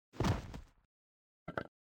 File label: pick up and drop corpse; baldursgate